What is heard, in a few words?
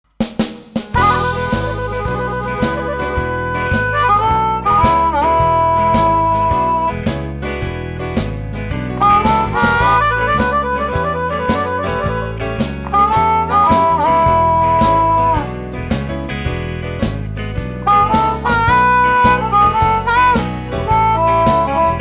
big harp, ampli harmonica